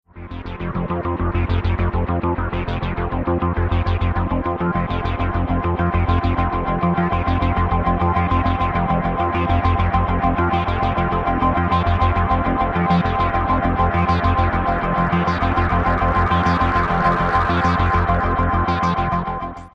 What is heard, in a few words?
synthesizer experiment